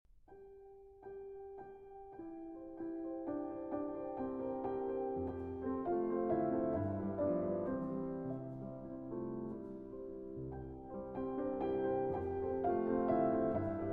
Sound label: Ballade In F Minor Andante Con Moto